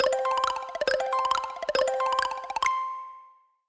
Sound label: free Spin Animation Snd; flowers